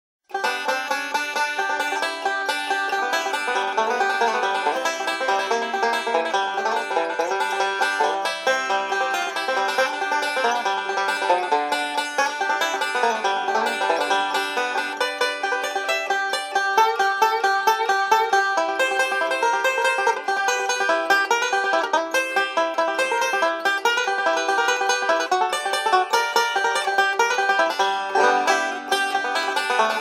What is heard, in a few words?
five string banjo